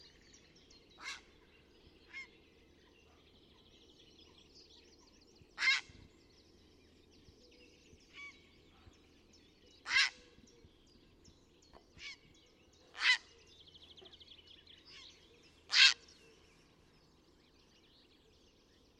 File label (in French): heron cendre; chant